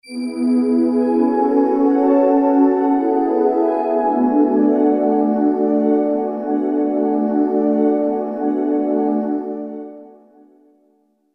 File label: Chord Pad